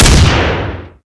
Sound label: deagle; weapons; cstrike